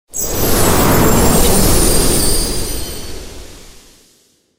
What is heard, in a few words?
christmas whoosh transition